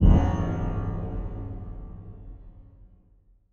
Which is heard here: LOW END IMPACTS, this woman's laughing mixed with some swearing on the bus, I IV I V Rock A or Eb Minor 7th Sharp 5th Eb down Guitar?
LOW END IMPACTS